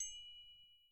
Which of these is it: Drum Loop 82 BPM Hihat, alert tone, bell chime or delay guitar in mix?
bell chime